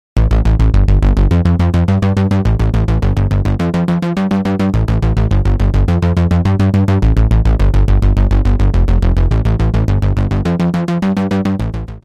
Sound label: BASS